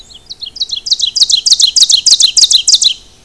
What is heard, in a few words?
warbler ovenbird